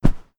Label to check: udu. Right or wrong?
Wrong